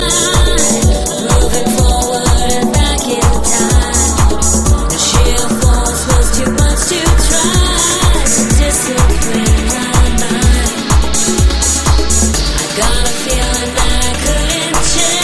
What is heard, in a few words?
lofi